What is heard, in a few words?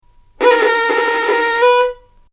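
violin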